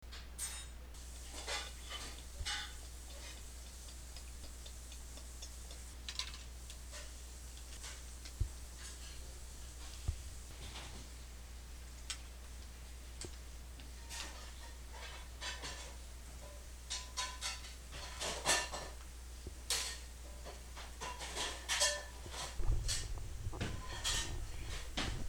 kitchen tidy up

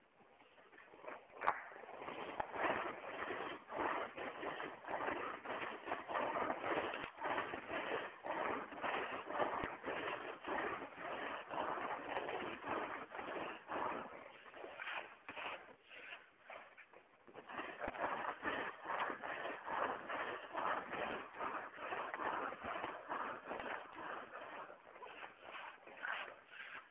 stoneage mill

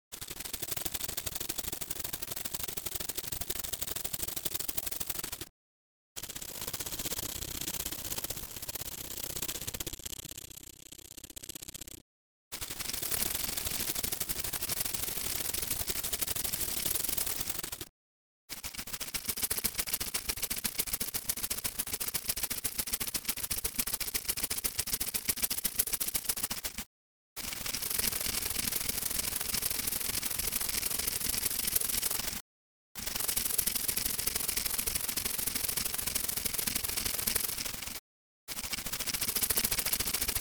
airport bulletin board synthisized

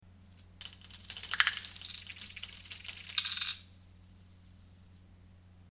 coins dropped on wooden floor

